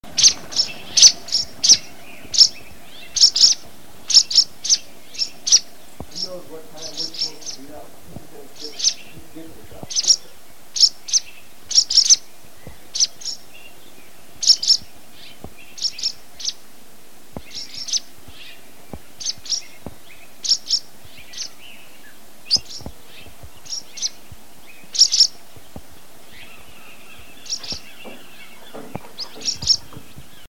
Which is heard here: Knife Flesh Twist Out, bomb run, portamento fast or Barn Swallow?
Barn Swallow